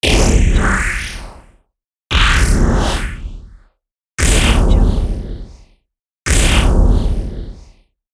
futuristic woosh; soundstormlabs

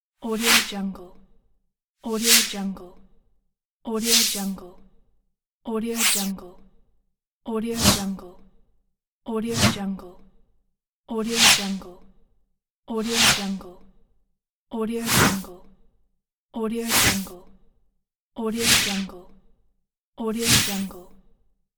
flash whoosh

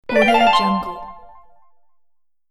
powerup game

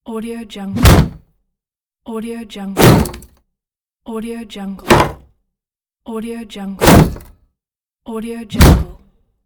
shield sound

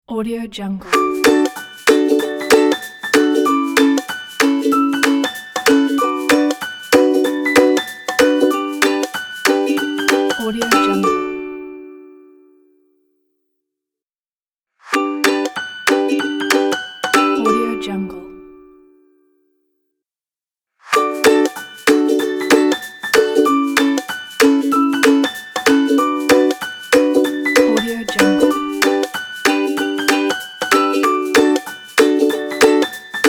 happy kids ukulele logo; alextunes

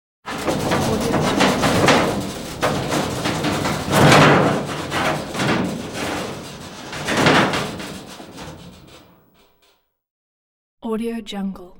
steady and hollow metallic rattle; hollywoodedge

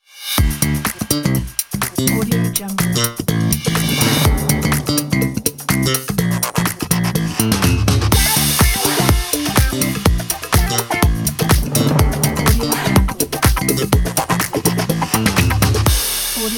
funk logo

